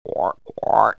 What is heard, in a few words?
frog